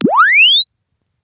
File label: Press Two Sides Chirp; Samsung 4 Recordings